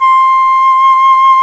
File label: FLT C FLT, Flutes, Flt Brt; Roland LCDP04 Orchestral Winds; 90 s Sample CDs; C Flutes 3-8